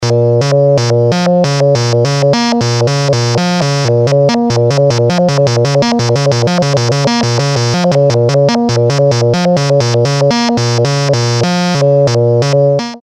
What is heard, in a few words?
VC Clock CV, modular